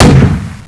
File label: GUN; Death from Above